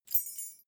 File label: key ring mid size fly tinkle; objekte; schluessel